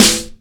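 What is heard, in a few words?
snappy snare sample a key, tuned to the A note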